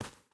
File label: footstep barefoot dirt run; Rust